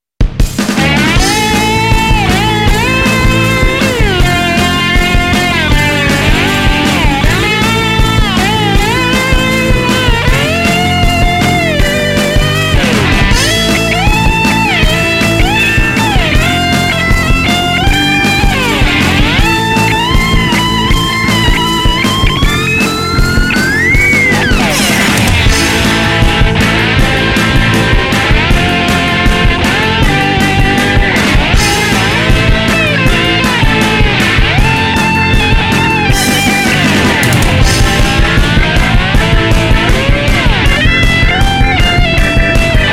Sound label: Guitar Solo Bottle Neck